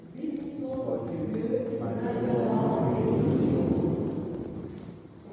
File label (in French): BENI SOIT DIEU, EGLISE; LOIZE 79; PENTECOTE